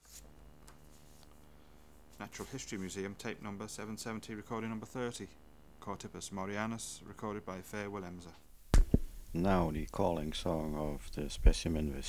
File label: Chorthippus moreanus